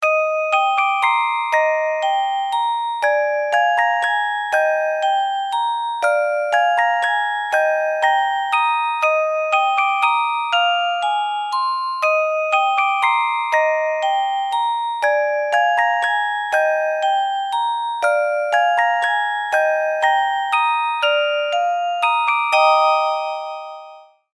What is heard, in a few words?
bgm